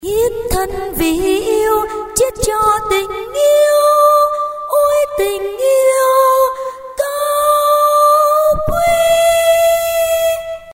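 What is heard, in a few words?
soprano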